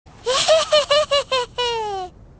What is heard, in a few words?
laughing japanese